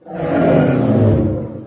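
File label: die, alien controller; king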